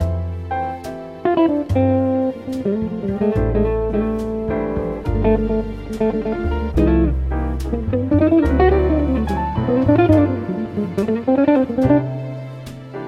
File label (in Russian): Rasslablyayucshee fortepiannoe radio Zvuki Vecher, расслабляющее фортепианное; радио